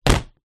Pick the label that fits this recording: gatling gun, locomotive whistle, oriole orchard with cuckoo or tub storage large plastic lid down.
tub storage large plastic lid down